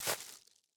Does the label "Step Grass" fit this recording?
Yes